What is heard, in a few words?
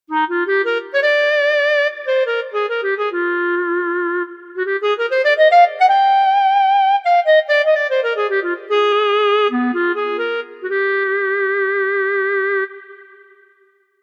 Clarinet